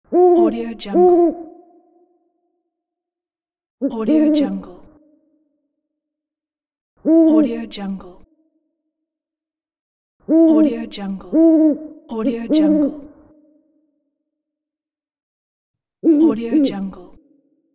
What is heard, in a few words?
owl; teldrassil